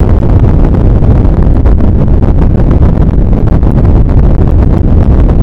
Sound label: plain fan